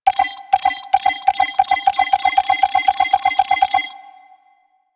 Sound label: Rocket Cluster Countdown; Enemies; Warframe; Kela De Thaym